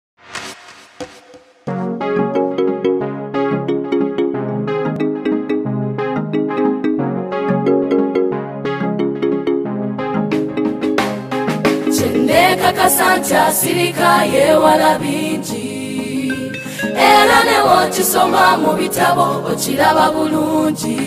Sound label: choir